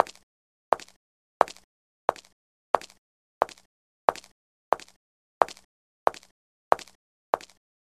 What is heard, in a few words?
foot step